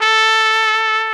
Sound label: SOLI TRP, Alpine Volksmusik, TROMPETEN; Best Service